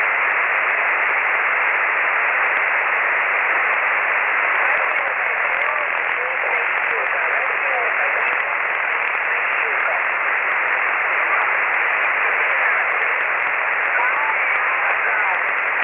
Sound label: usb msg